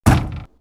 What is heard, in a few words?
Metal Impact, perc fx; X-Static Goldmine